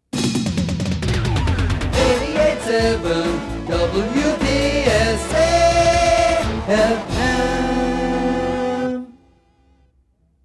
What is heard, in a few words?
JINGLE Cluster Fast